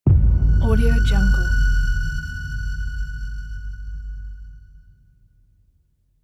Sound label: horror movie impact